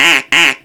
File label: Duck Call